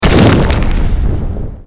damage; gwyn

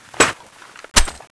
clip in; tfa cso; skull4

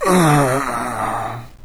zombie death; doom3

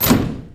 metal door open

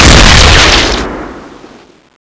shoot, weapons; cso

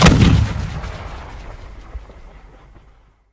artillery shot

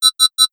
arc gun warn

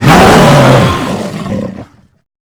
shout long; morbus

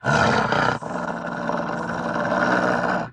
growl, dog

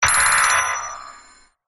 gunstand alert; gow; turret judgement

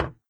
footstep; doom3; commando

